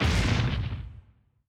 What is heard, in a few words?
Tail Jump Landing